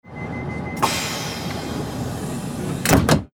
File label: train door closing